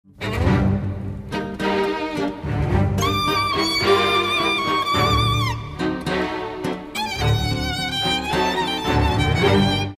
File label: tango; moulinrouge